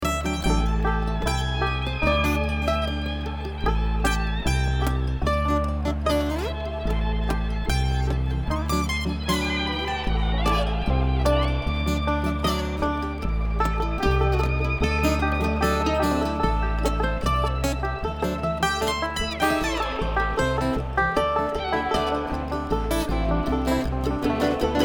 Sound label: Traffic Jam